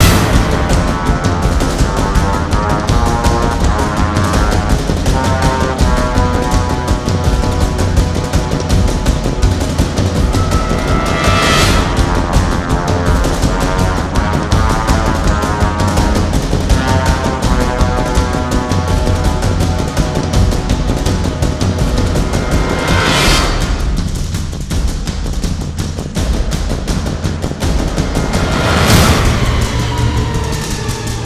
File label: PERC LP